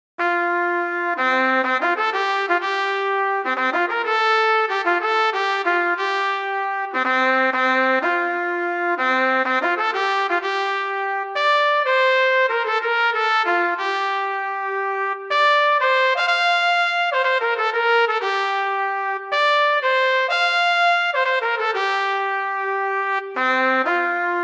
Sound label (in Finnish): Trumpetti; Rotissöörit